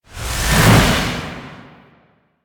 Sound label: Slide Swing; Warframe; Weapons; Duviri; Incarnon Adapters; Melee